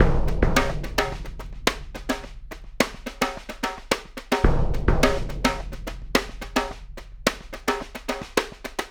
Big Drum and Snare; Sonic Foundry (Sony Creative Software); Essential Percussion; Drums; Big Drum Combos

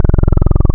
Diode Saw, wavetables; Animoog

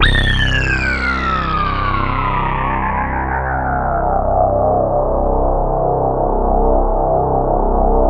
REZZONATION; Greytsounds; Scott Peers Sound Engineering

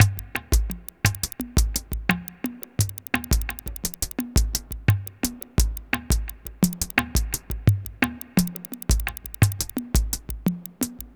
Ethnotronic, Processed Percussion

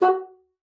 bassoon, F#4; Xsample VOL-6

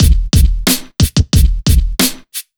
90 BEAT, Pure Electro; USB Soundscan vol.03; AKAI

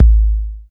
Kick, Alesis DM5

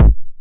909 BD Tape Sat

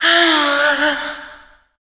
FEMALE, Dance Vocals

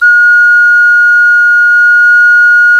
CLAYFLUTE, Heart of Africa

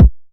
909 BD; Goldbaby Tape808 & Tape909; The Tape 909

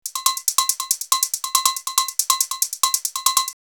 Candy 140, drumloops DrumMachines; X-Static Goldmine

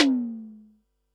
tom, Pearl SC-40 Toms

